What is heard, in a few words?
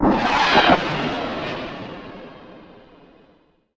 Rocket V2; ImpGal2; groundwar